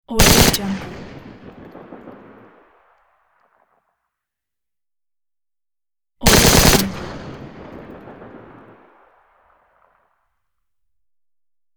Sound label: automatic shots; shining wolf